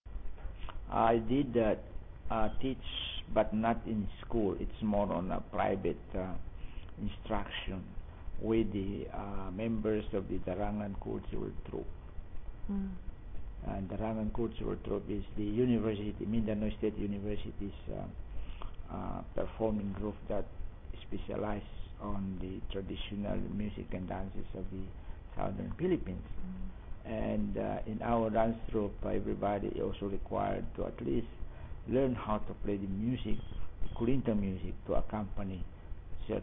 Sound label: Performing Kulintang Music